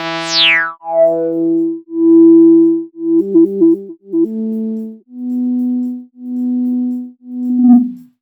SYN, Roots Funk; USB Soundscan vol.41; AKAI

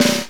snare, R&B Snares, Roland

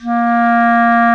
Clarinet & Bass Clarinet; OMI - Universe of Sounds; EII Factory Library

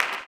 CLAP, blues & soul; ILIO; Vocal Planet

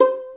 Violin Pizz C 4; InVision Interactive; Lightware VOL-1; Instruments & Percussions

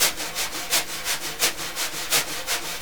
Synclavier Percussion, World & Orchestral; ILIO; Synclavier Percussion 2

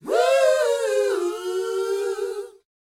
LET, Vocal, R&B Dance, DANCE 120

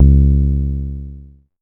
C#, PIANO; Big Fish Audio; Synclav FM

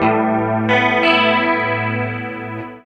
GUIT; East West - Phat & Phunky

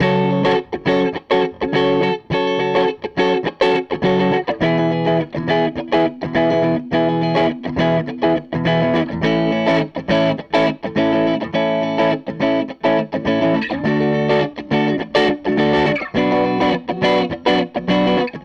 Gretsch Guitar Loop

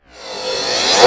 REVERSCYM, CYMBALS; USB Soundscan vol.20 - Fresh Disco House I

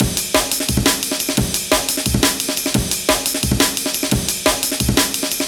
Amen EQ 175, Classic Remastered Jungle Breaks

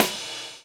DOWN, DOWN KIT 78

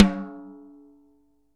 SNARES DRY; Real Mega Drums

